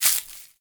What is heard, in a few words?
step leaf; DeadFright